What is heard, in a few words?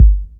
Kicks; The Low Down; Loopmasters; Jon Carter & Alex Blanco; Block Party Essentials; Drum Hits